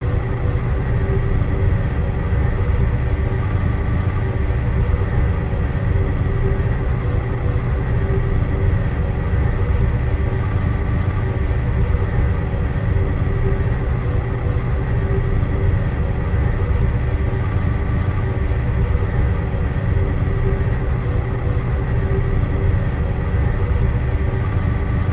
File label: Ambiance, Machine Throb; Constant Throb Of A Spinning Machine Shaft